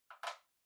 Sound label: Calculator Far Battery Insert